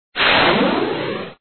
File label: beam; pendekar